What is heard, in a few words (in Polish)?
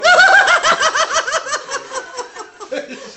najlepszy smiech na swiecie